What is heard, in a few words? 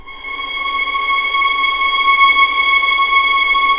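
C#6 legato mf violins R; East West; The Ultimate String Collection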